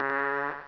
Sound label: FART